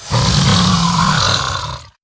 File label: Apex Predator Play; Zixor